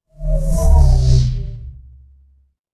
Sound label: transition sweep